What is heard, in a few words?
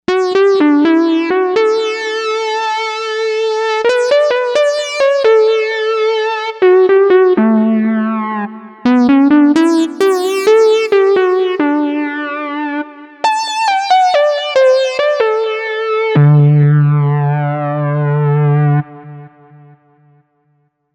Mini Saw, Novation UltraNova; Factory Patches